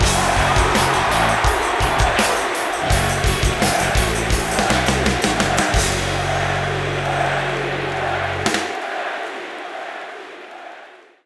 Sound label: victory; Drunken Robot Pornography; Inago Rage